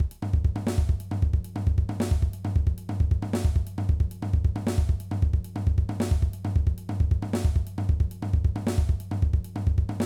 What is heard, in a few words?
Impressive Beat